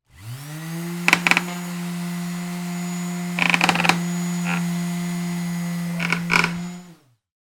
industrial orbital sander electric sand windowsill bang vibrate against frame; zapsplat